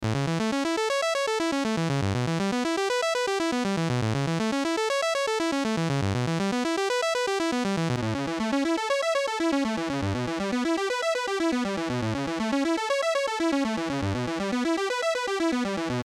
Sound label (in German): Saegezahn Chorus Roland